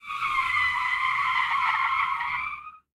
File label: skid asphalt; Blind Drive